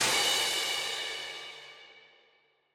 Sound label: cymbal long